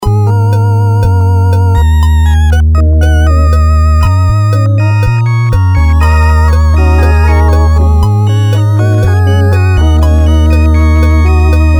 Farfisa Fast 5

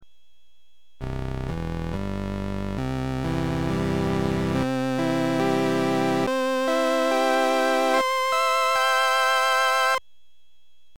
Brass, combo organ; Rheem

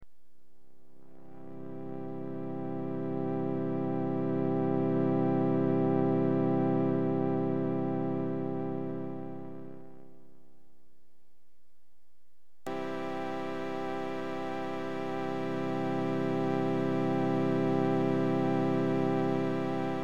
Flute, combo organ; Rheem

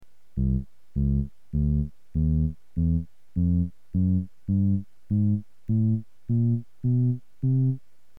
Bass, Gibson combo organ; Bass octave played staccato

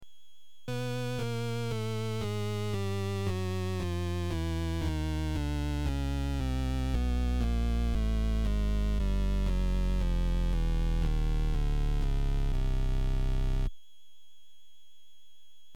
Bass And Treble, Rheem organ

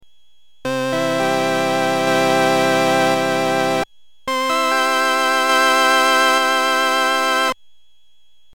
Bright Mellow, Rheem

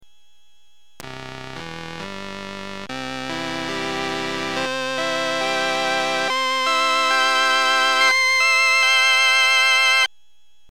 Reed, Rheem combo organ

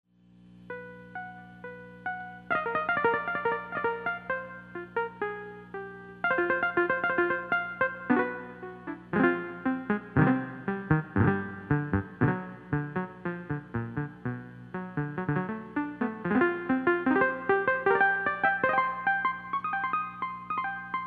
pro piano banjo solo, Farfisa Professional organ

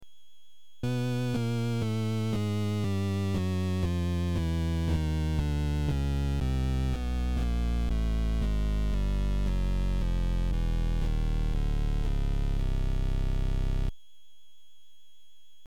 Fuzz Bass And Treble, Rheem organ

